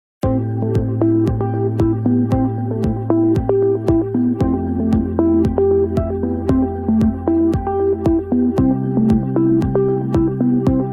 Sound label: magical story Video Effects; Bible book fairytales fantasy